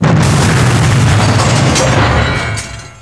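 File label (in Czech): granát výbuch; Mafie 1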